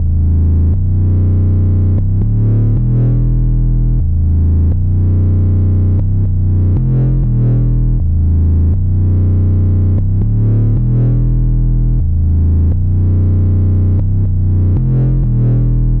Neutron Dirty Sine Bass; Behringer

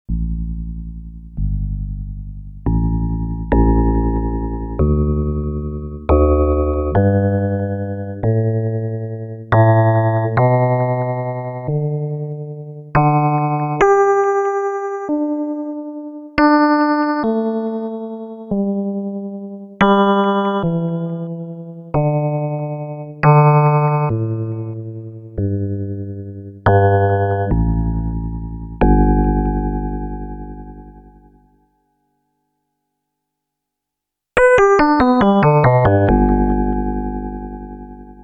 Sequential Pro 2 FM E Piano

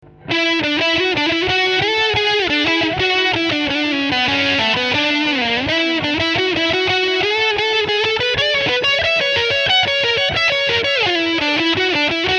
Bridge singlecoil filter pos. 4

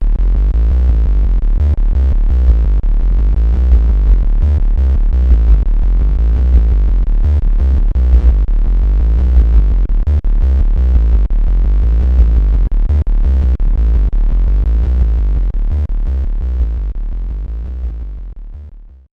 Boost Saw

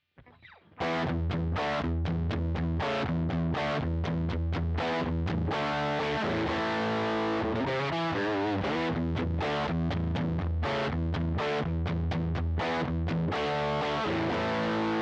EL34 LIVE; Boss Nextone

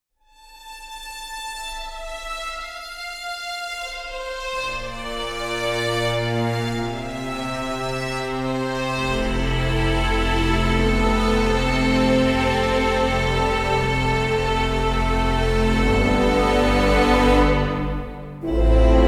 Sustain Strings Brass WW

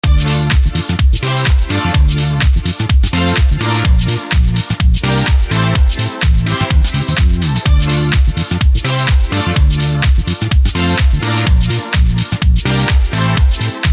Avenger Funky House; Avenger Funky House 2

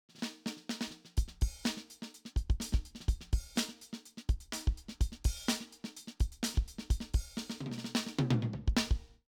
Simmons SD1200 Classic Funk

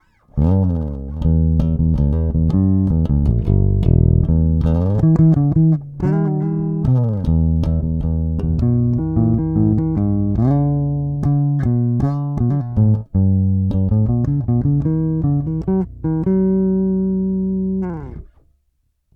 Neck neutral

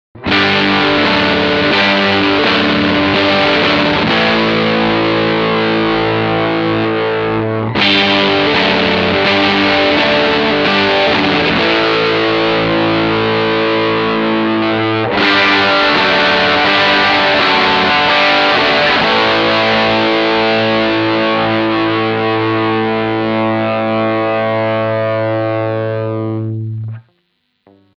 Humbucker Heavy 3 Positions

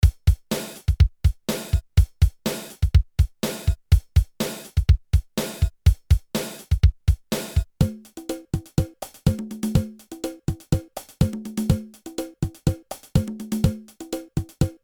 Roland TR 626 Preset Pattern

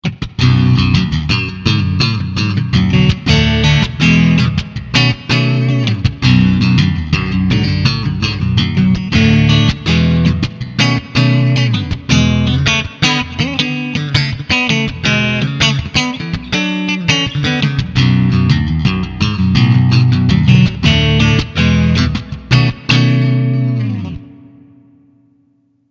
Clean

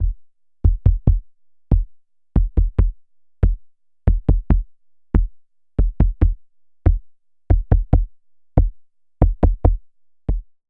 Behringer Neutron Bassdrums

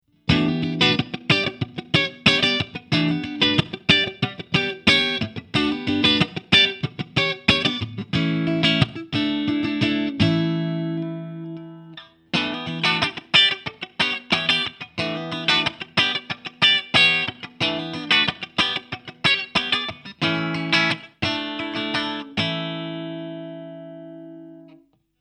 Joyo Bantamp Zombie II Clean

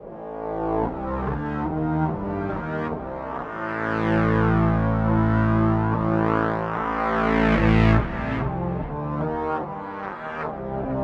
Boss SY 1000

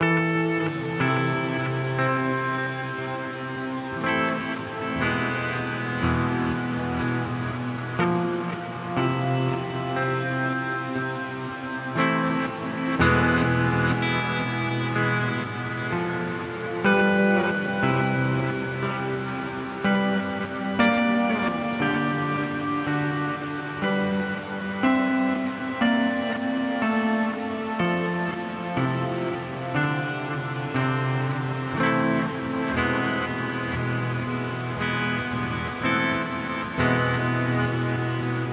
Mooer GE300 Lite Space Sound

